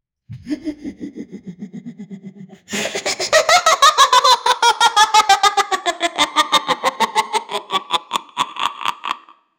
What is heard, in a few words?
scary clown laugh